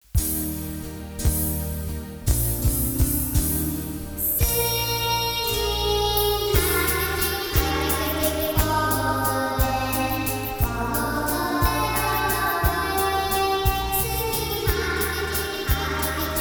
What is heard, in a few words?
Singing hi jiggy jig (Nursery Rhyme)